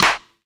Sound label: hand clap f sharp; percussion; single hits one shots